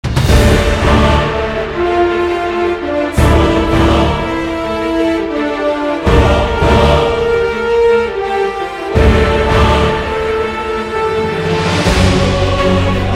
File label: free spin music; celestial goddesses